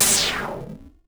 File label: Synth Hitstop